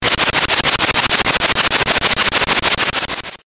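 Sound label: runing, nes; ninja gaiden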